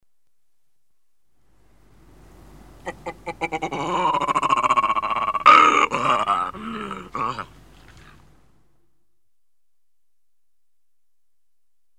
Adult calling S. Africa (M), Africa The Natural World; The BBC Sound Effects Library